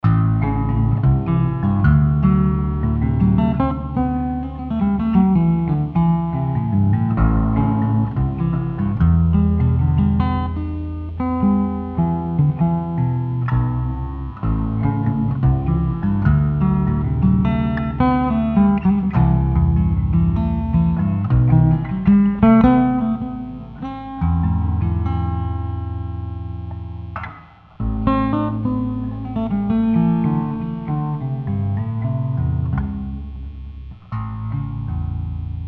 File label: Deep Guit